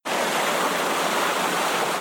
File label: Carpet longer; john-travoltage